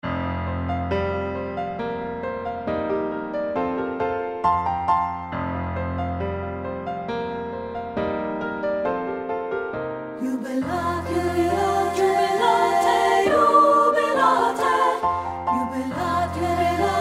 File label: VOCAL